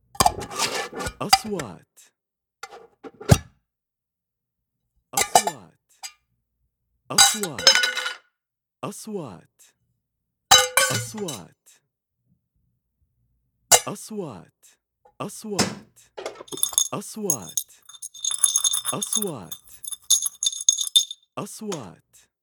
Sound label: plumbing screws scrap bolts irons metals